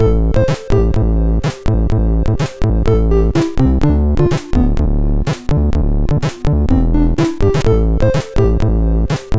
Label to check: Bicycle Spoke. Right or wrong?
Wrong